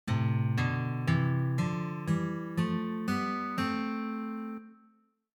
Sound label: 1st Octave Harmony